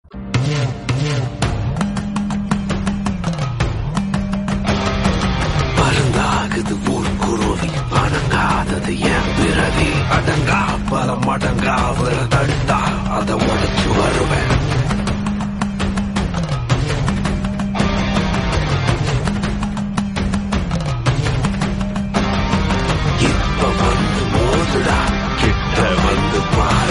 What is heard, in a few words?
Bgm Instrumental; Soorarai Pottru